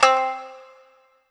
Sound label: Kawai K5000W Shamisen C4